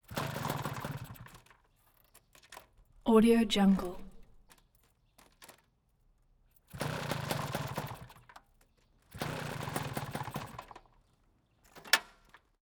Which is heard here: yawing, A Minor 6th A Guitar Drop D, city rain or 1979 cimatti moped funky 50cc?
1979 cimatti moped funky 50cc